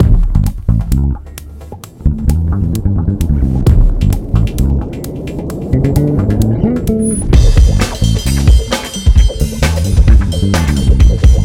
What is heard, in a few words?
Menu music; Spy Chameleon